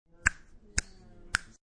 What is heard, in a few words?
Three Snaps, Three finger snaps.; Finger Snaps Three 1; Human